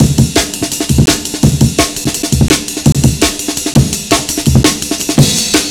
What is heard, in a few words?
168, breaks